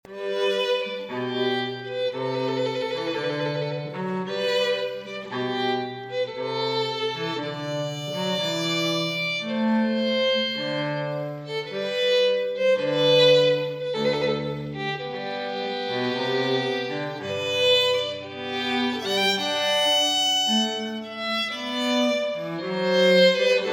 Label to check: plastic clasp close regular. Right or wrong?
Wrong